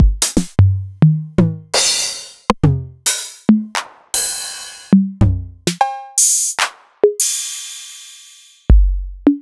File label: Novation Drumstation